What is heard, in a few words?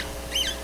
call, L pod, Orca; SRKW; Alwin